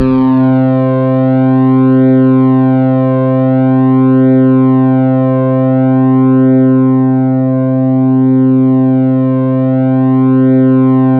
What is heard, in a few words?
C3, SynthLine